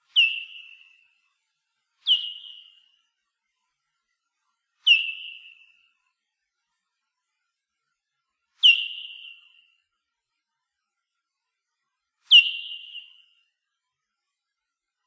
Abendsegler, fledermausrufe; fledermäuse rufe

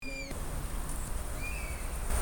sperber

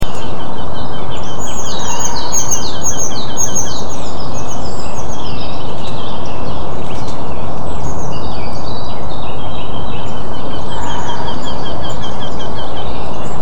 wendehals

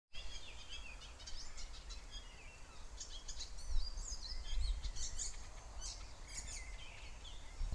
gelbspötter; sattnitz

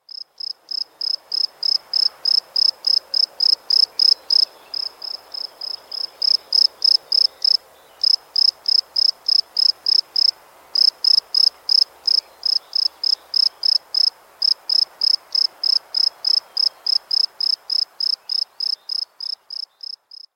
feldgrille; nachttiere

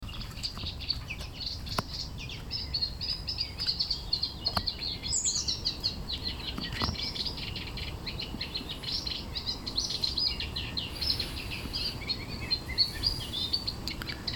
teichrohrsänger; Vögel

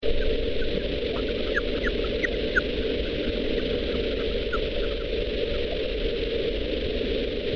wasserfledermaus

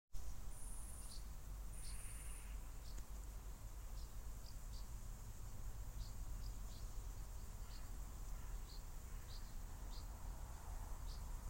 mehlschwalbe; teich; teiche

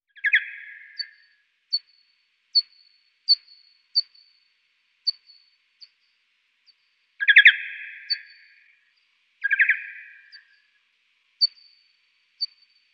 mückenfledermaus, fledermausrufe; fledermäuse rufe

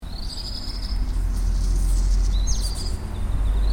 hausrotschwanz

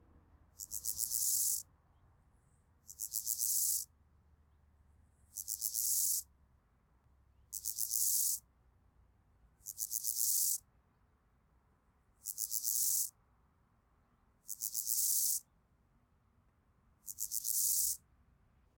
wiesengrashüpfer; heuschrecken

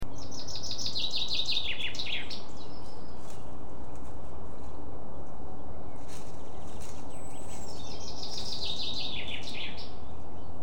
buchfink

